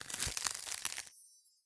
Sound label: Enemy Freeze